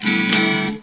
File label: Sample guitar